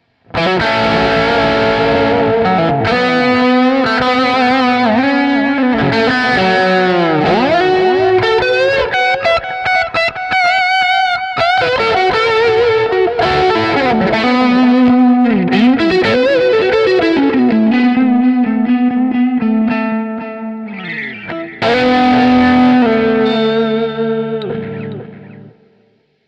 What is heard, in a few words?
Zoom G2X FOUR Lead